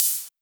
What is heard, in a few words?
Rides, Cymbals